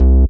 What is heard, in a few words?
BASS